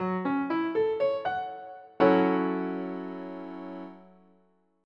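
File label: F# M7, guitar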